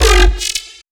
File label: BASS SHOT